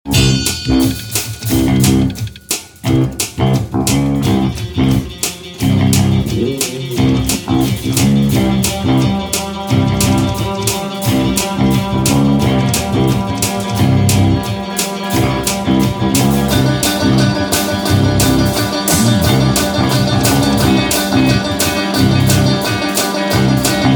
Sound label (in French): basstrompes